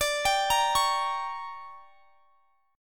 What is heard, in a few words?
D Major 7th Suspended 4th Sharp 5th, chord; DM7sus4#5 arpeggiated